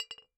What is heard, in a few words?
Bottle Struck